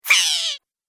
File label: Gremlin Hairy Scary; Monster Sound Effects; horror